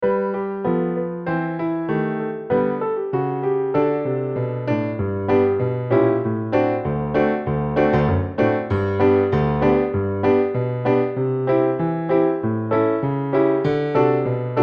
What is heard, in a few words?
piano